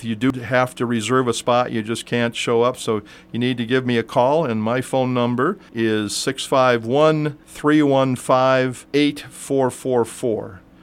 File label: Sings Phone Number; Hastings